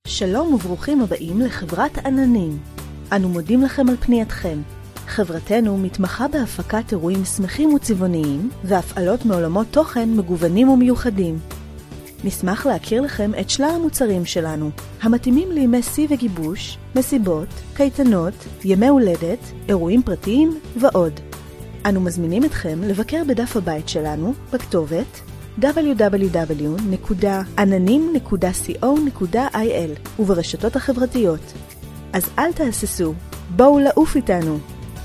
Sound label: music